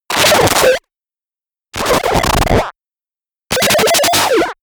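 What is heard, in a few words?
glitch, electronic; comedy cartoon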